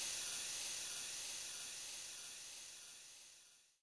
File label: Brake, Train; OpenBVE